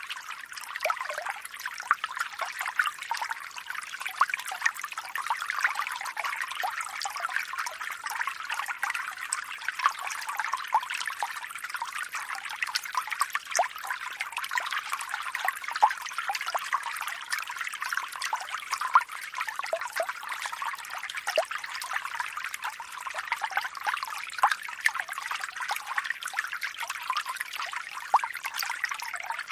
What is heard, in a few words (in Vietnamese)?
Tieng nuoc chay